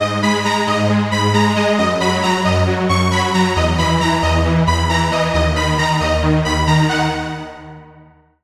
Lead; Routed Sound; Sylenth1 Expansion - Reality Maker Vol.1

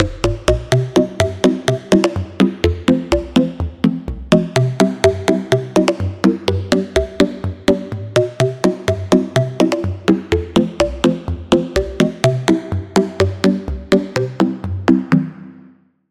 Pluck, Sylenth1; Pluck - Silent Elf; Routed Sound; Sylenth1 Expansion - Story Walker Vol.1

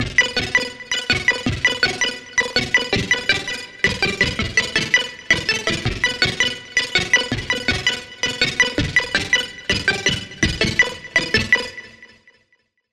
Pluck; Save Point; Routed Sound; Vital Expansion - Hyper Gamer Vol.1

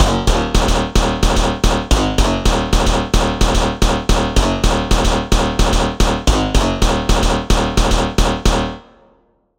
Pluck; Routed Sound; Spire Expansion - Chaos Bringer Vol.1

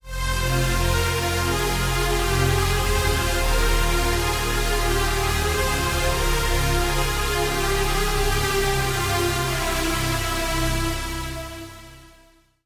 Pad

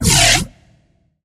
Bass; Ultra Chaos; Routed Sound; Serum 2 Expansion - Chaos Bringer Vol.1

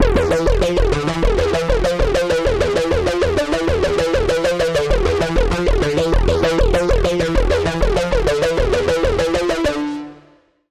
Lead; Routed Sound; Spire Expansion - Doom Carpenter Vol.1

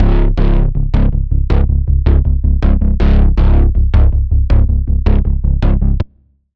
Pulse; Routed Sound; Serum 2 Expansion - Quantum Enforcer Vol.1

